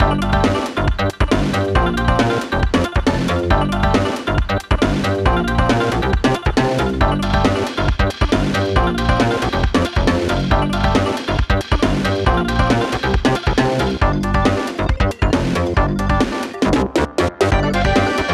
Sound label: 137 bpm; fashion police squad beta